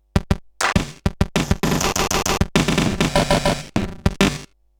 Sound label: Bent RX17 (100BPM), cyborg beats; musicradar